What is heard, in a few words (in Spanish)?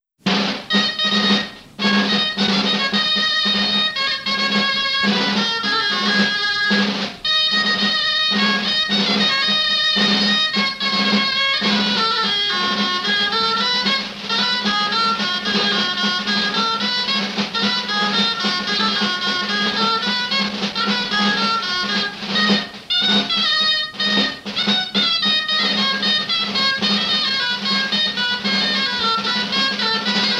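Baile asentado, Bailes tradicionales en Valladolid; La Pedraja de Portillo; Baile asentado III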